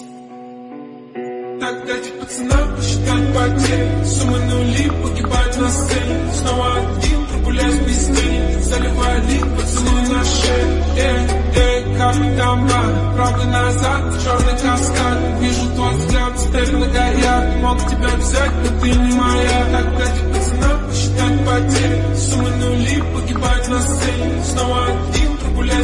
Remix Attitude Ringtone

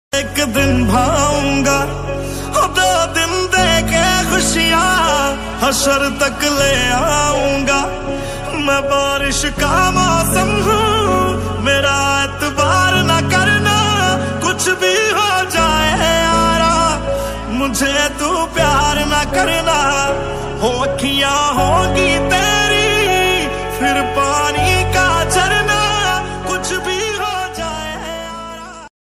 Sad Song Ringtone

